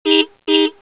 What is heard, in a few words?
suz horn, motos; cidade